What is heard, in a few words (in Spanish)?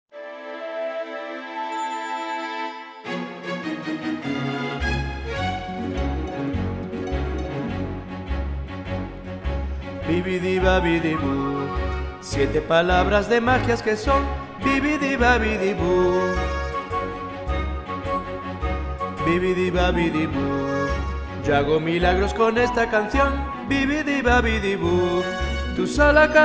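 tenores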